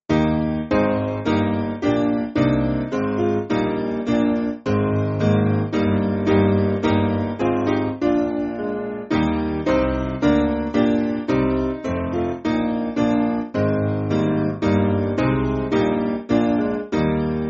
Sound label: Piano, church music; Love Divine; Bethany